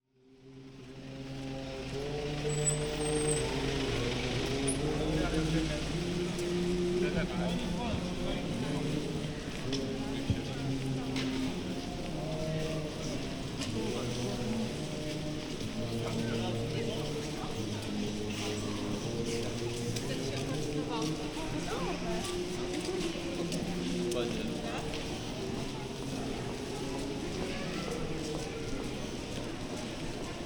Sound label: binaural